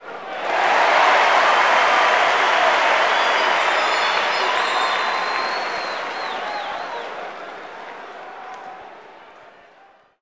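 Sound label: Basketball Crowd Cheer; SFX Kit; Crowds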